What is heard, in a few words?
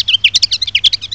BIRD; M8; CMI Factory